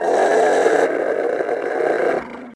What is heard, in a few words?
wolf die; DAoC